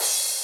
crash

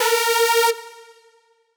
synth

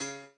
piano

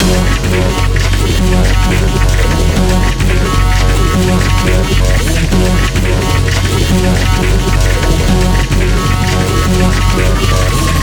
BGM; Luxembourg Zwee

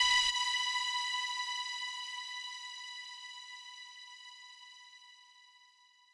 lead

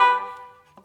Key Basoon; Luxembourg Zwee

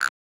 acid, 115

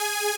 lead

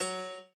piano

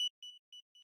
arp

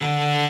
cello

